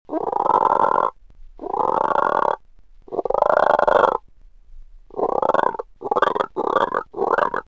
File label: many frog